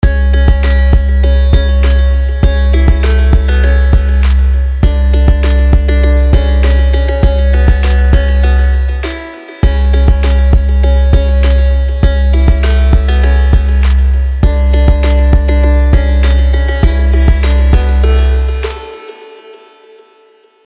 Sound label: trap jingle